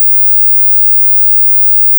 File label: Square 20000